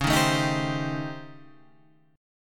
A 7th Sharp 9th Flat 5th; 9,6,7,8,8,8

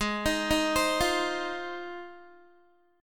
G sharp 6th G sharp down Guitar, chord

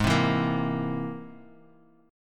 C Augmented 7th Ab

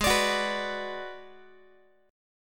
G sharp Minor 7th Flat 5th, Guitar; strummed; x,16,17,16,15,x; 4A,4E,5A,5D,5G#,6C#

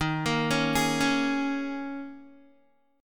Eb 7th Suspended 4th, Guitar; Eb7sus4; arpeggiated

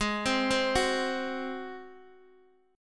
Ab 7th Ab down Guitar Open D

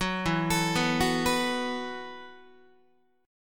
E Suspended 2nd Suspended 4th E, down, Guitar; arpeggiated; JGuitar

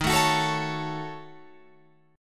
Eb Minor 7th Flat 5th, Guitar Open F; Ebm7b5 strummed

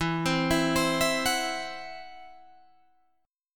E Suspended 2nd Sharp 5th, Guitar, Drop D; 14,15,14,17,17,14

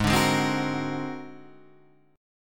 Ab 9th Flat 5th Ab down; Ab9b5 strummed; JGuitar

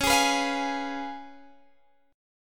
Db 7th Db down Guitar Half Step Down

